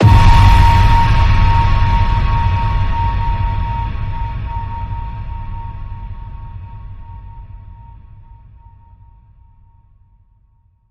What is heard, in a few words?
Ping Pong Passion, A sharp